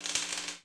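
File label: ambience interior arc; Tony Hawk's Project 8; XBOX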